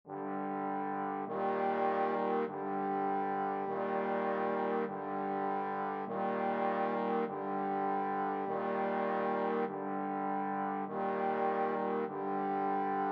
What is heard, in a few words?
funeral march, trombone quartet